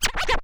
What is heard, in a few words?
Cuts, Vinyl Record Samples